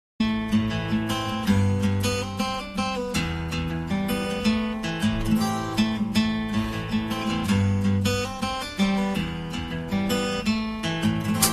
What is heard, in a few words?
beautiful guitar